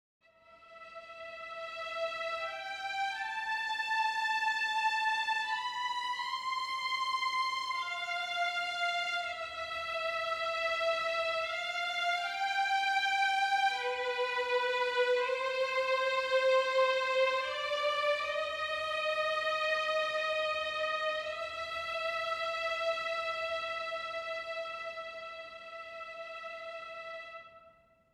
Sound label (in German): Violine Leg High Position